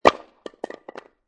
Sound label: Wood Log Drop On Concrete, Impact, Wood Log, Dropped On Concrete; Materials